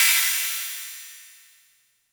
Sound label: Cymbals, Elektron MachineDrum; SPS1 MKII